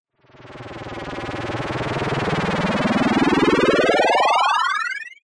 sound design fluttering digital ascend

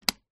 household external mains switch off

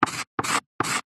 chalk draw lines fast on blackboard; 3x

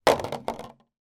foley plastic small thin container lid throw down; zapsplat

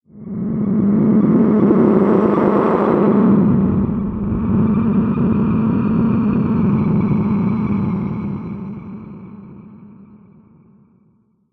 sound design texture dark scifi; sound effects one

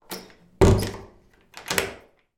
household door bathroom shut lock; sound effects one

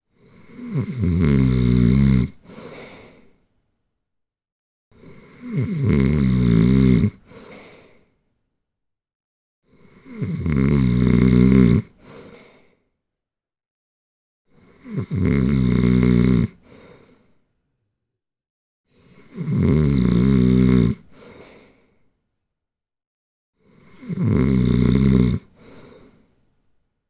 human woman snoring 37 years old

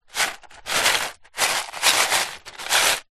food biscuits crumbs pieces movement foil tray

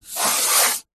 wood smooth hand fingernail rub

